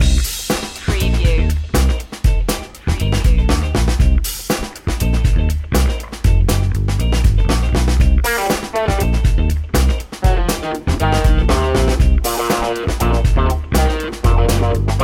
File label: groovy funk loop, Funky, soul Stylish track with big influence from the 70's funk